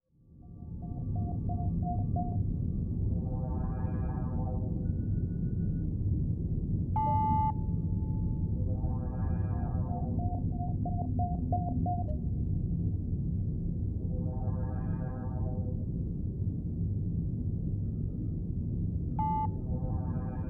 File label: Space Station Bridge Ambience